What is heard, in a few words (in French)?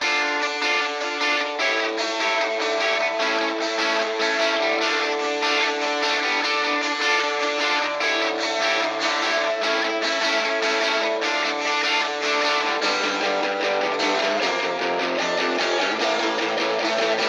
g1 g2 rythm avec plug; final finalplug